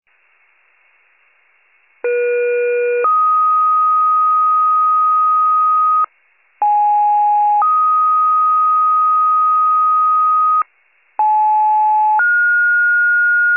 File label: fire, pager alert